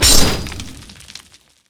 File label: power ball; robo rammus